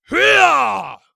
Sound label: attack